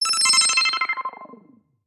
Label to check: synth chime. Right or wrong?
Right